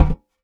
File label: metal vent, footsteps; player; Dystopia